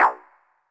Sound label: Stabs, Elektron MachineDrum SPS1 MKII